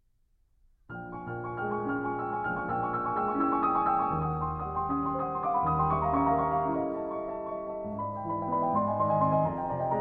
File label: Concert Etude, Reverie Moderato; Eight Concert Etudes, Op. 40 II.